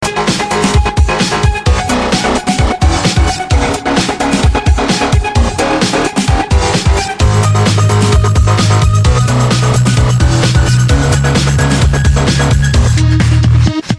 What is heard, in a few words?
Techno Disco